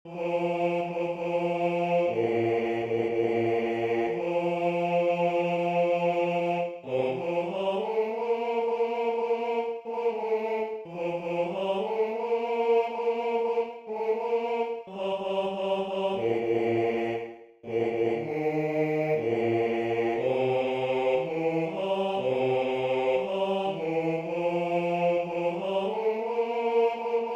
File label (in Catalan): Baixos